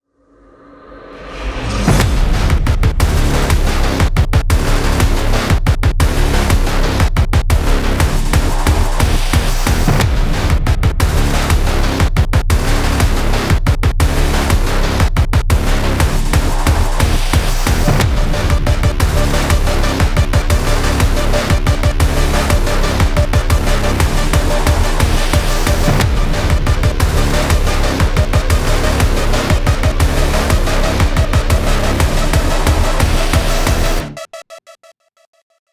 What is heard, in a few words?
Music Boss Phase3; Dlc1 Maykr City Music Boss Phase3 6